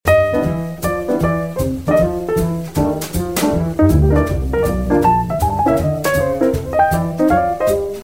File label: ii V in the Blues